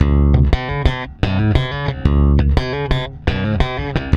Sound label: PWR D#, Bass, PWRSTRUM; Spectrasonics; Bass Legends; 128 PWRSTRUM